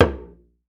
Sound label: Talking Drums, c1; Retro Sampler; EMU E-III